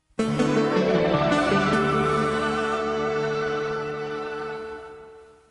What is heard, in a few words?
Newscast Effect